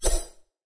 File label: Sword Hit; raycaster